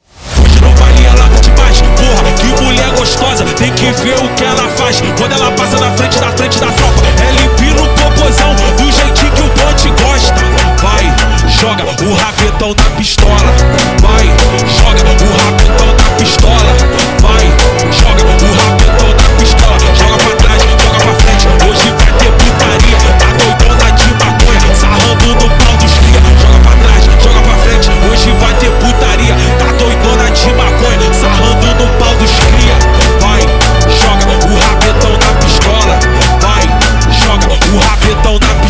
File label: Brega slowed, funk